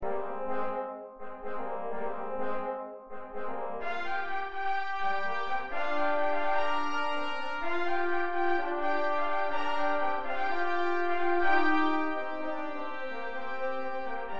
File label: Trumpets and Trombones